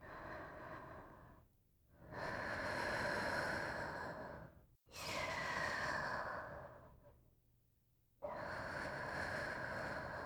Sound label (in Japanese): 寝息ループ